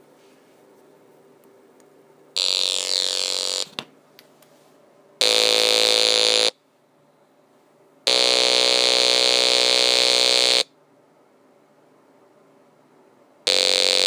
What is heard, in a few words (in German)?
Melodie